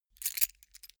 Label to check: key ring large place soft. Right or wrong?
Right